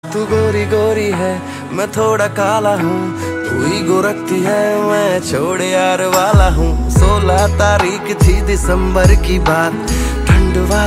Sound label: lofi ringtone; lofi lovee; sourav joshi vlogs